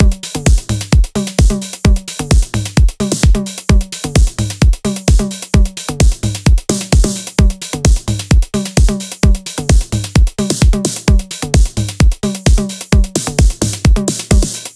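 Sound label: Full Beat, drums, eurodance; сэмпл ударных евродэнс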